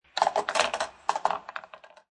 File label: Billiard Pool Snooker Two Balls Fall In Pocket; Pool balls, snooker balls, billiards balls